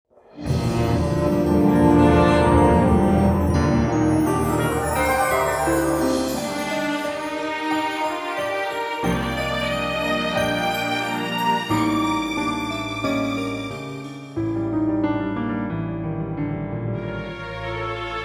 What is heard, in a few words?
Ending Animation Music; Ending Animation Music Test; Battle Princess Madelyn